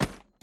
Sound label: hit